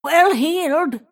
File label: Move pain; Snapfire; Dota 2